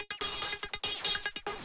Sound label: 144 Techno Hats Fx; Dance DJ; Drums; Pro Tools Essential Loops; Digidesign